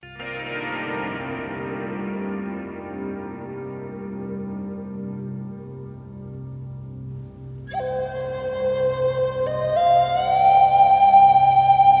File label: ambient with nature; memory of a dream